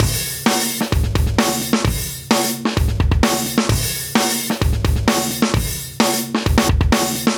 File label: Live Breaks, 130